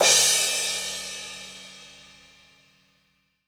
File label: Gretsch Cymbal Crash; 1967 Gretsch Kit; One-Shots; Sonic Foundry (Sony Creative Software); Vital Drums